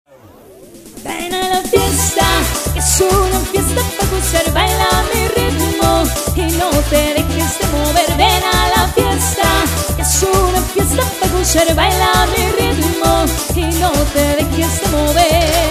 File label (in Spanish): Baile caliente POP